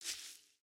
Foot Grass Walk, footsteps

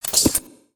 Broombot Idle Thin, creatures mechanical; Inventor Broombot

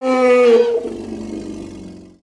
Pet Elephant Idle; creatures pets

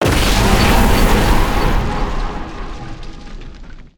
Siege Tower Impact; destructibles

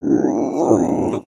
Stun; Skullkin; creatures organic

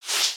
Text Outgoing; aronwk collection; ndaudio

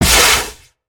Barrier Shock, ambience spaceship

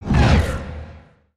asteroid whoosh by

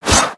Swing; Little Friend

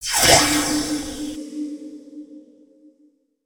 Crane Pose

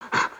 idle, creatures organic

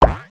pet jump

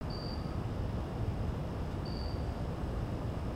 city loop; nimbus station

